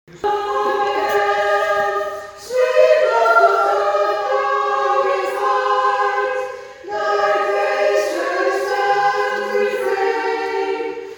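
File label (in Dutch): Alt Sopraan, choir; Come Again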